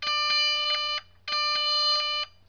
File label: alarm loop